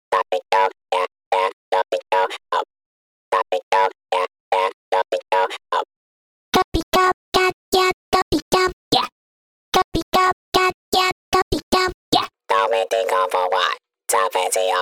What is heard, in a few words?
voices